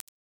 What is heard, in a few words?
ui icon click with soft